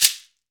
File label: Latin Shakers, shekere